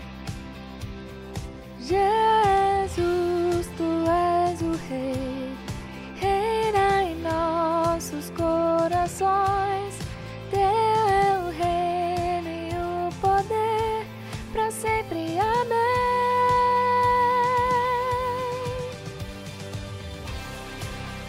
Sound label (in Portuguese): SOPRANO; a igreja canta